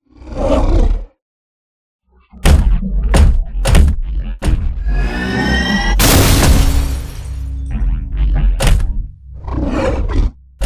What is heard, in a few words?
sphere start; Titanfall 2